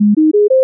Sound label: 4 note rising